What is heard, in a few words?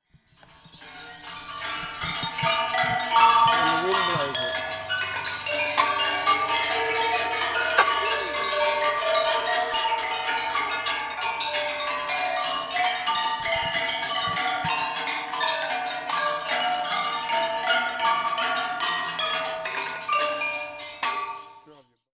Wind blow